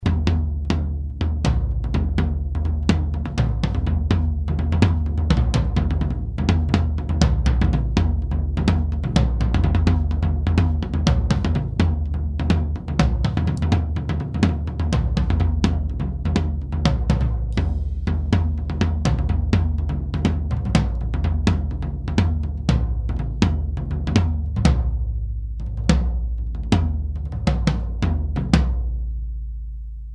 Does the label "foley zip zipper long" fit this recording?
No